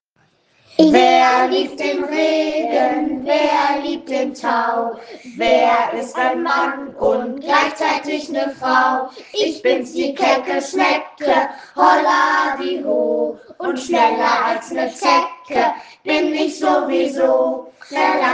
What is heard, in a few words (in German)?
Schneckenlied; Schneckenprojekt